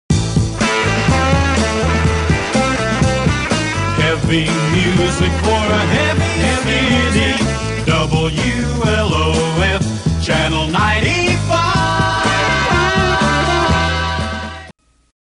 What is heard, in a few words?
jingle Heavy Music; WLOF